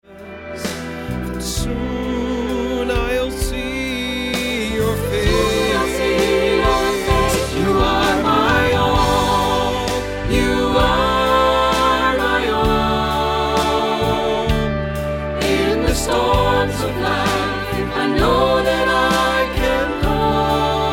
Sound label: Gospel Choir